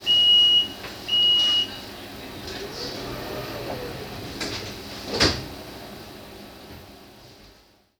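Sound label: Door Close, Train; H-Start 415 Stadler Flirt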